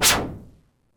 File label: Whoosh, Korg DS-10